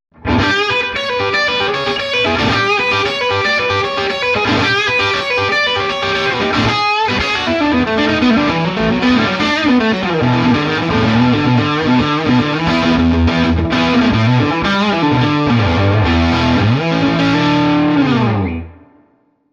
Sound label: Swart 30 Crunch; Gibson SG-Crunch 2; super 30